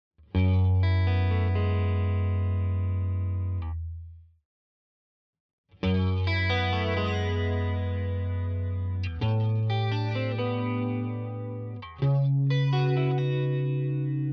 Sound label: Chorus; Trinity